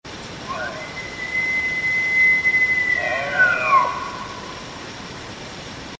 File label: Elk bugle